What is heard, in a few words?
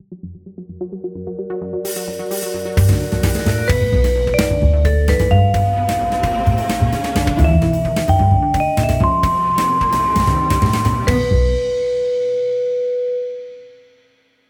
Intruder Confirmed; gun point